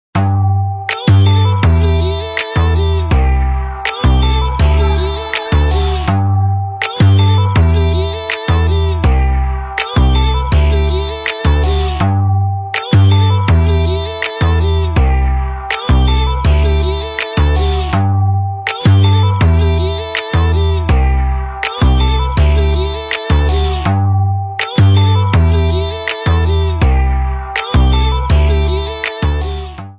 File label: instrumental